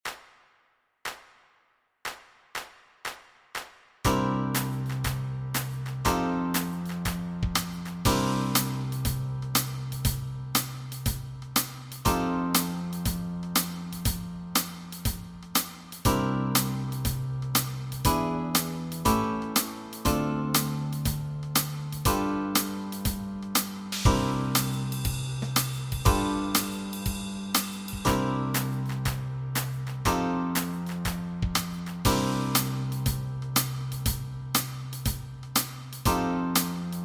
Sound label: Backing Track, walking bass